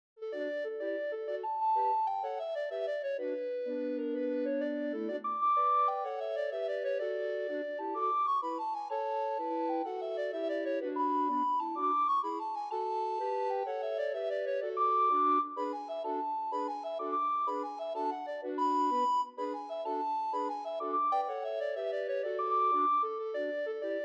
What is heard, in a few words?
Bamboo jig